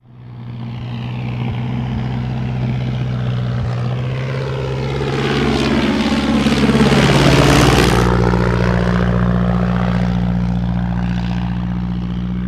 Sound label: plane distant passing; WAR